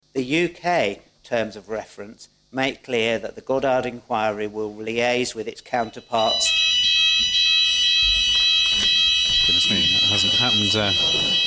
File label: alarm